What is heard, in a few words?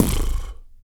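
vox percussion, Vocal Planet Jazz FX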